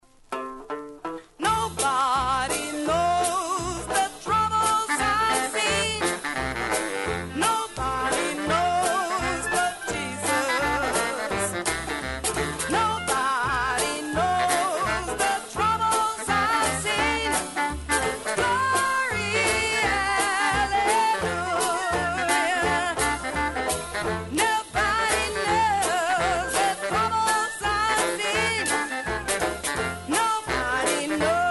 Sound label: Jazz Stompers